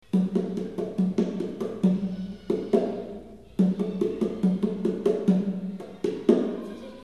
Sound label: bong, derbouka; El José tocant la derbouka